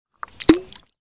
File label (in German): blubb